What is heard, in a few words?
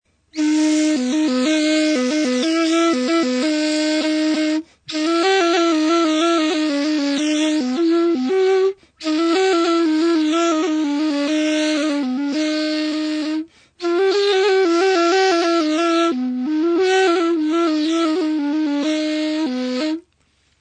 ney